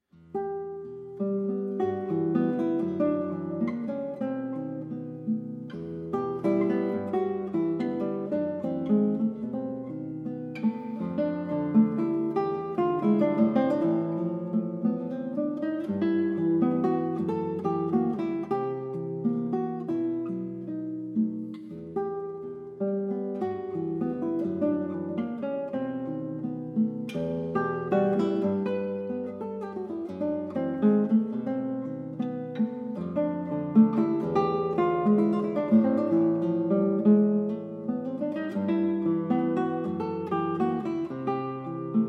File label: tre notturni guitar